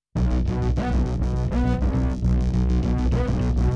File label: bass; musdb18